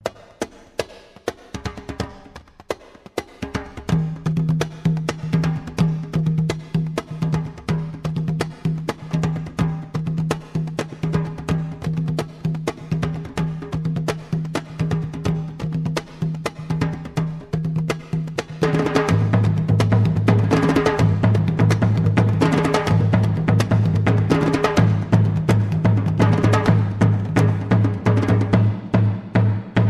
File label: intro djembe beat